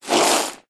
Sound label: household sponge large wet soapy foamy squeeze squelch; zapsplat